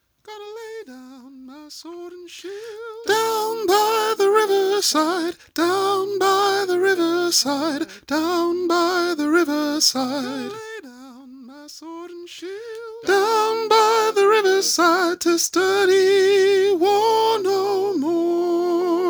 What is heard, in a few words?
Down By The Riverside Soprano